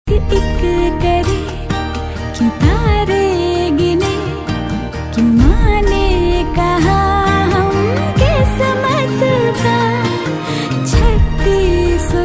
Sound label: Romantic hindi ringtone